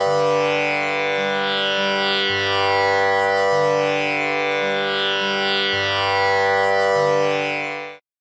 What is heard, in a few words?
tanpura drone g monaural